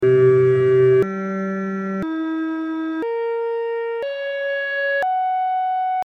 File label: microtonal variations of the all interval chord akkord c g e b cis fis; akkord 12 c-1 g-1 e-2 b cis fis sukzessiv